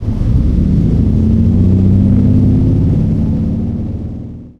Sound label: leo2a5 engine int load, tanks; War Thunder